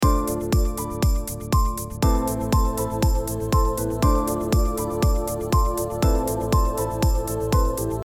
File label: test track disbalanced